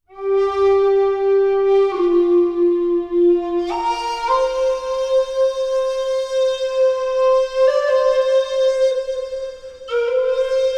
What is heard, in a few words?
Meteor Flute; Sonic Foundry (Sony Creative Software); Ma Ja Le Ethereal Textures; Flutes